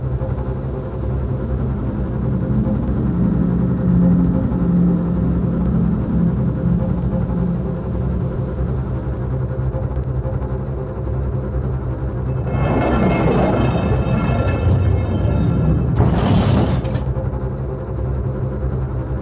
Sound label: title song; ld21 ESCape